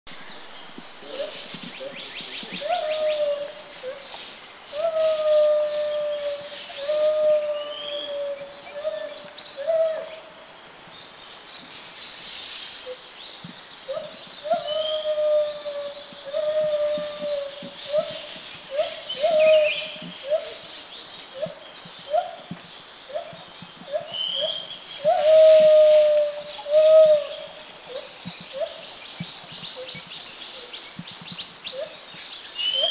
White-handed Gibbon; Thailand; Tone Pariwat

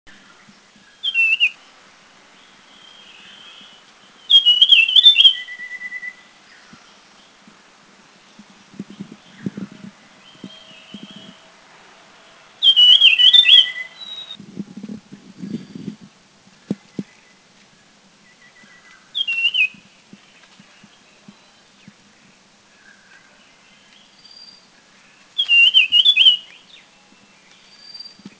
Dusky Fulvetta, Taiwan

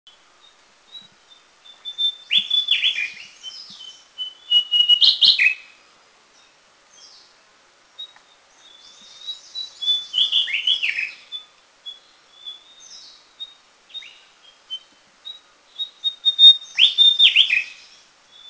Grey-bellied Tesia; Mishmi; India

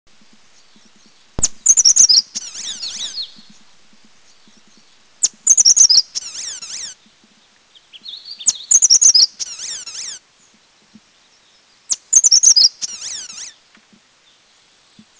Grey-sided Bush Warbler; Dirang; India

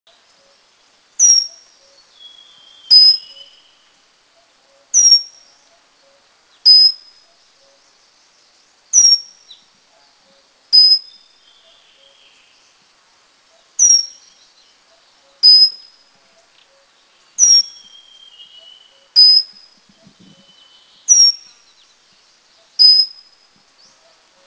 Pygmy Wren Babbler; Mishmi; India

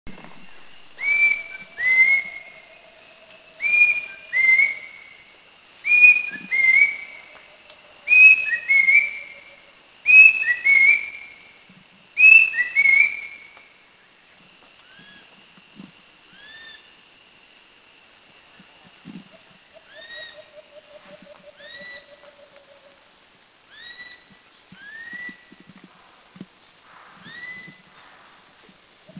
Rusty-breasted Cuckoo, Philippines; Brush Cuckoo (Rusty-breasted)

